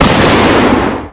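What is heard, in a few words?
cannon fire; action-quake2; weapons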